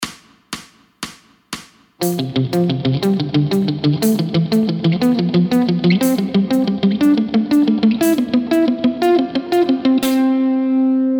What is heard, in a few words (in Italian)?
Intervalli di Quarta